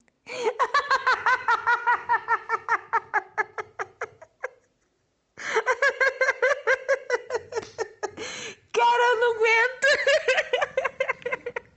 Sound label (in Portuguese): risada